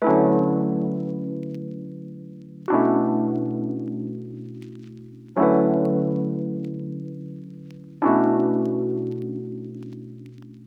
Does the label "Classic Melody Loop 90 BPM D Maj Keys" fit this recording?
Yes